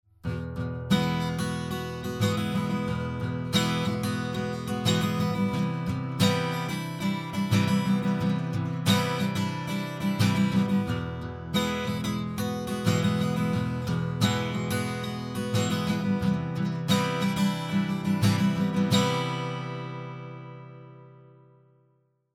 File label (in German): WA 47jr Git Acht